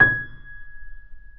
G#5 off, Grand Piano off mic; AKAI S6000 CD-ROM - Volume 2

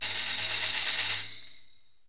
TAMB SHK, ETHNIC PERC; Roland - LA Composer vol. 1

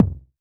polyvox bassdrum; Formanta Radio Plant

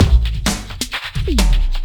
Beat, Drums & Percussion; Electrocution; Beats

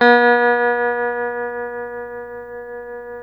TELE TREM C, Guitars, TREMO; Spectrasonics; Hans Zimmer Guitars VOL-1; TREMO-LUXURY

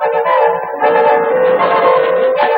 Ancient Music FX, Industrial

